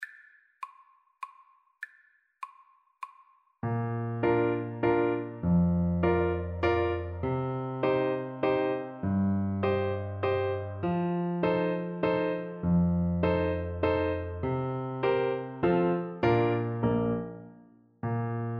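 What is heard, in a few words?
Schubert Waltz in A alto saxophone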